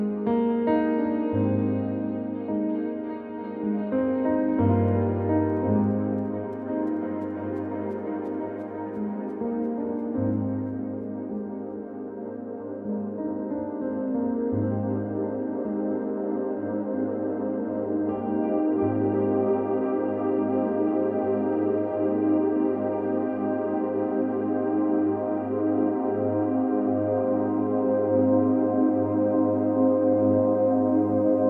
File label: Fade Away, Pads